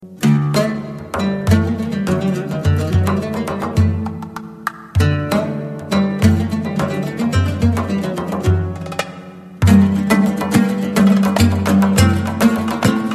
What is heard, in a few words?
perfect instrumental melody